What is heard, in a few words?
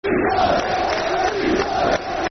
Sea Siders [Football Chant (Soccer Song)]; Blackpool Bfc